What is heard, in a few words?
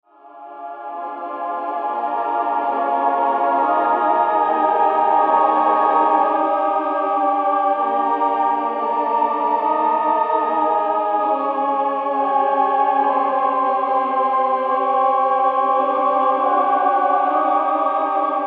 Atmospheres